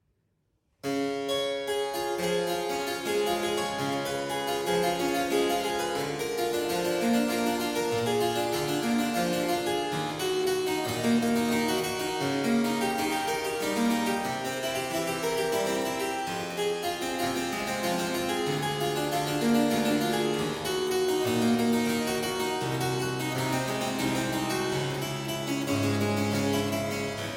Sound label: Harpsichord sonata Arpeggio; Scaramuccia; Pisendel. Neue Sonaten; Anonymous.